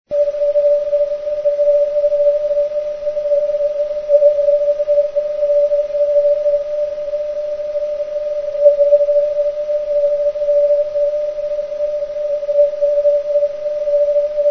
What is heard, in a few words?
cw